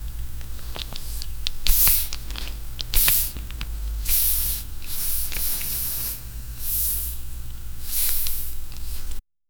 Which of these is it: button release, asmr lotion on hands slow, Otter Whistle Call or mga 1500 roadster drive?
asmr lotion on hands slow